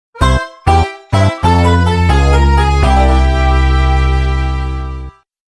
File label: Game Over; Super Mario 3D Land